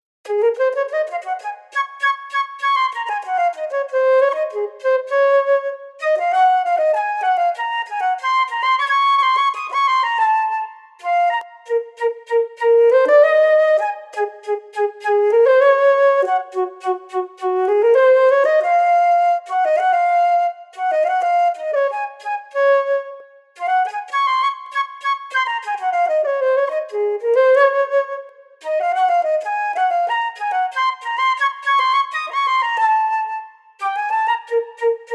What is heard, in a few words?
FLUTE